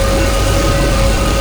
Scifi Forcefield Loop; SFX Kit; Sci-Fi Loops

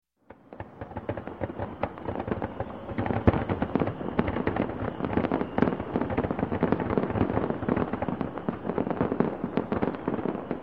Fireworks Several Medium & Large Explosions; AT A Distance; Sound Ideas 2000 Series

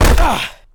Football Hit Tackle

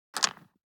DICE on Cardboard, Grab, Standard, Five Dice; Samples; ArticulatedSounds; DICE Sfx Party (Extended)

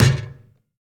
Basketball Backboard

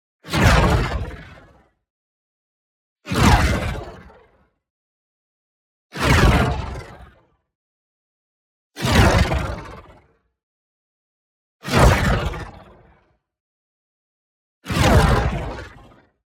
WHOOSH SMALL Fusion Stream; boom library; Matter

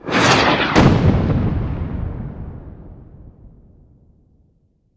Rocket, Fast Pass By And Explosive Impact; gun and weapon sounds; captain audio; fully loaded

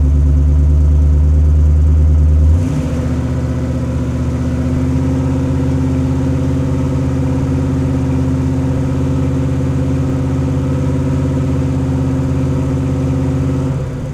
Truck Explorer Fast Idle; Trucks

